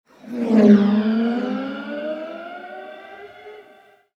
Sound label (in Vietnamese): Tieng xe dua chay